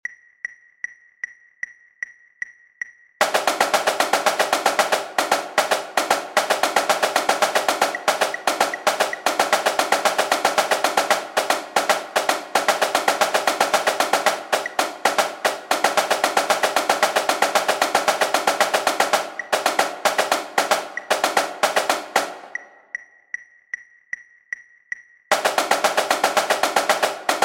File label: sequence timing snare